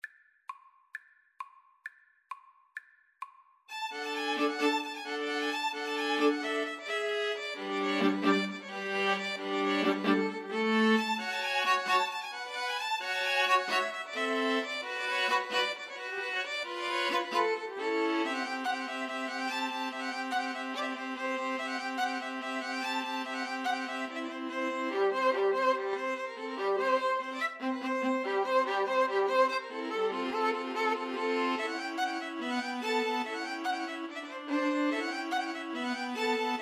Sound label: romanian folkdance, string trio